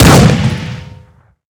sniper fire; weapons

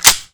carbine bolt forward; weapons

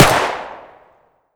mk7a4 distance fire

